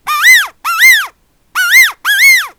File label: parrot